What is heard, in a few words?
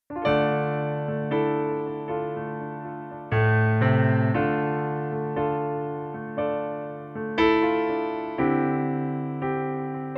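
piano playback D minor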